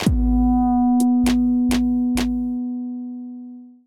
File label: outro; jumbleplast outroA